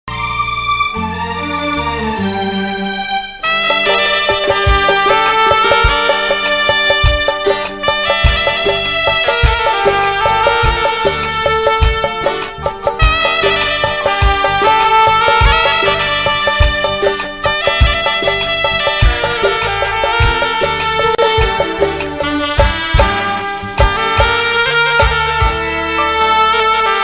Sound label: saxophone ringtone hindi ringtone